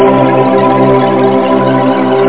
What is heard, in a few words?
ooohhhh